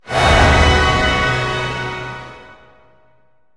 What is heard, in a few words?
battle end win; War Thunder